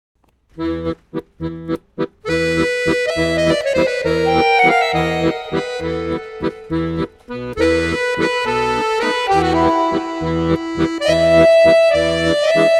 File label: waltz